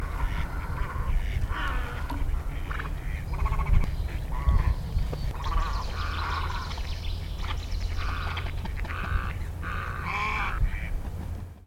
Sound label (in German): seidenreiher